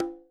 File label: Conga Hi Slap